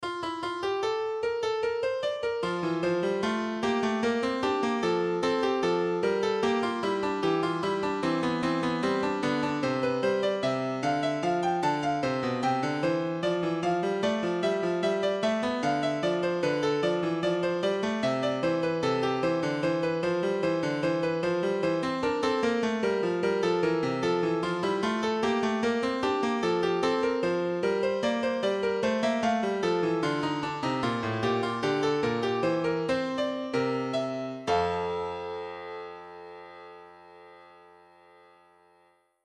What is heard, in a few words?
Twopart invention in F Major; Shane Brandes